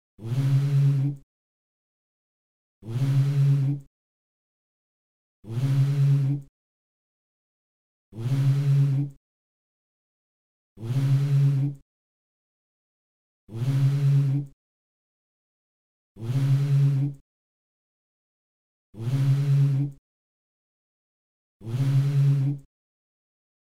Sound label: Himene tarava Tahiti